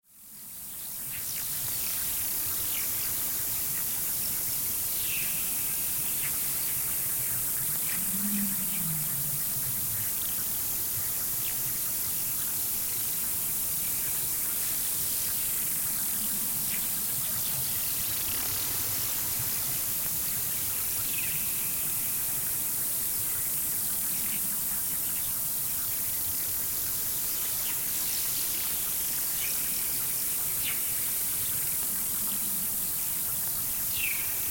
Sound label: Alien Jungle